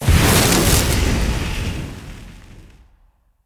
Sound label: Forcefield Border Start; Traps